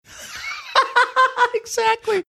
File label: laughing saying exactly